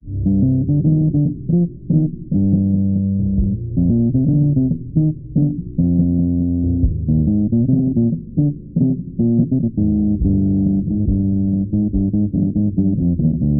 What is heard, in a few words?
bass, Loops Phonky